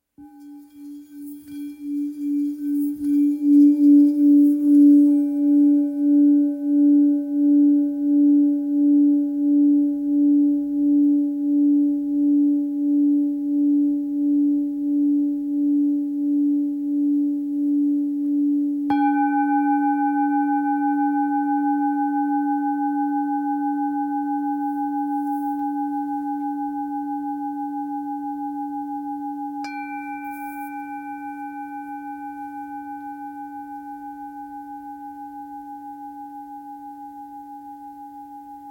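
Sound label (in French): Bol real full moon re, bols chantants tibétains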